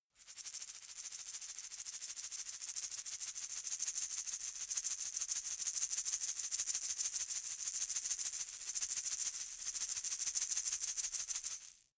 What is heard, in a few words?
shaker plastic egg rattle